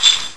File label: chain